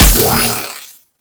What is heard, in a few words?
Miter Alt Shot, Weapons; Warframe; Tenno